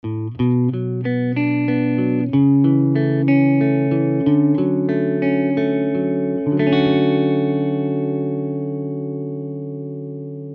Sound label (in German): Gitarre processed